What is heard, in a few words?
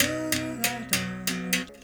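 86 high berimbau phrase; Roland LCDP11 Africa VOL-1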